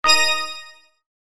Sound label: break and chain